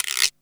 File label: guiro, percussion; Back In Time Records - Big Bang